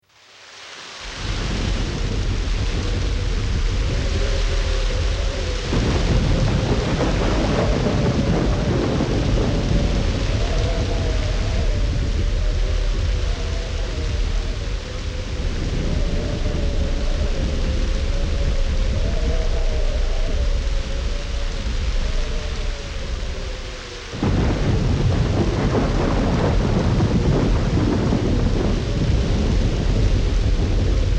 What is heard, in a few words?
Heavy Rain, Windy Thunderstorm Ambience